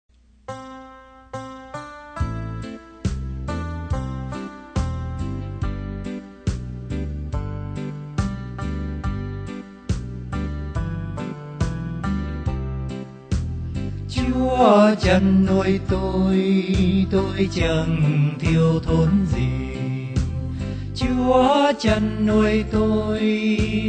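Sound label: soprano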